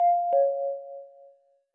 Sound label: contacts offline